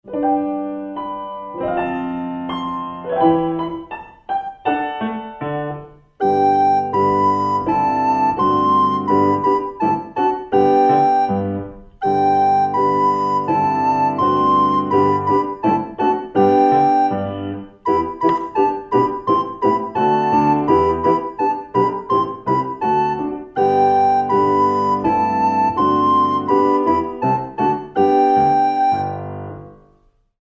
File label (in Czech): doprovod a fletna; koledy